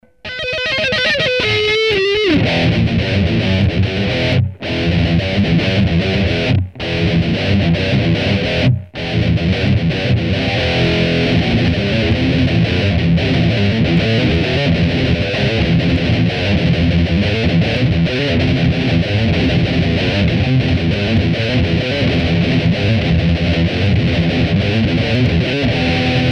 riff solo; hellfire

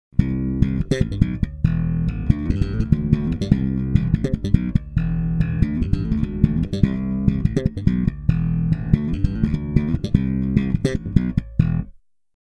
JB neck bridge

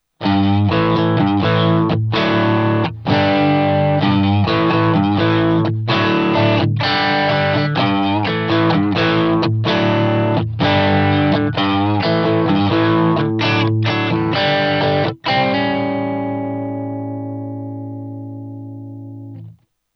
tele drive bridge neck

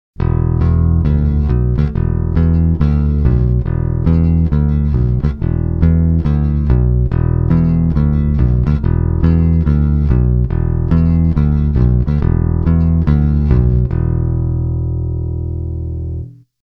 JB neck

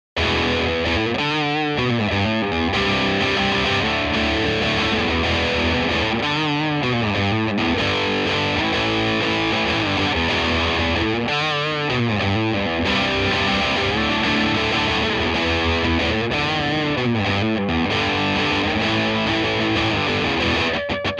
EMG Higain, 7string

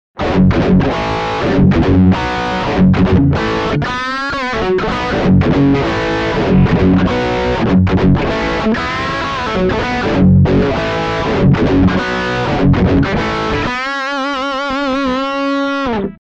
Bridge Drive, sabotage

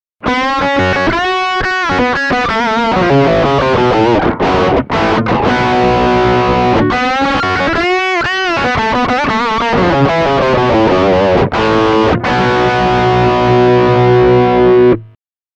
PAF Bridge Drive

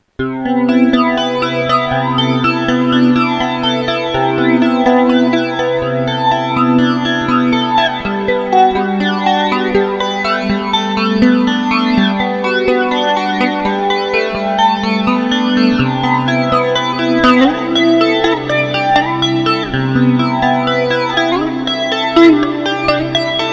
Clasic Strat Clean FX Neck; classic strat humbucker

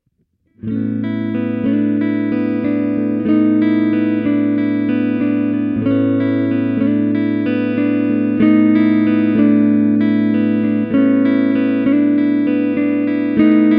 Neck Clean

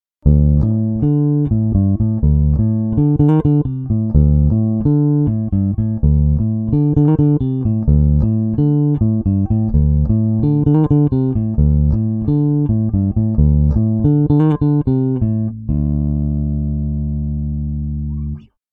Neck parallel, bassblaster; rownolegle

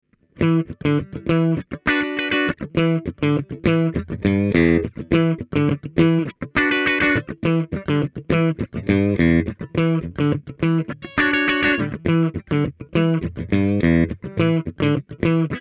bridge, mp90

